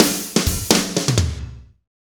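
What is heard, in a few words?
slow shuffle, Roland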